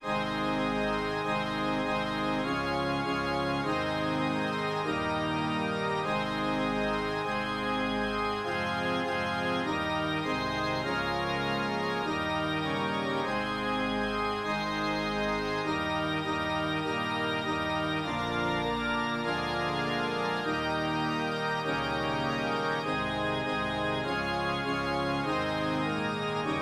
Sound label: organ